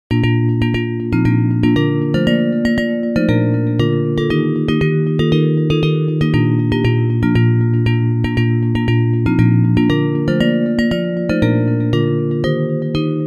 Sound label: Bells, Hymns